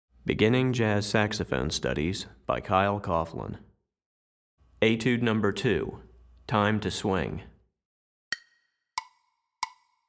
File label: Alto Etude, Saxophone; Beginning Jazz Saxophone Studies Alto V1